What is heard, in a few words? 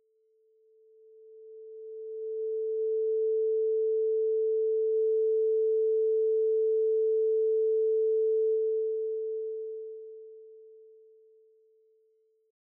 Sinewave 440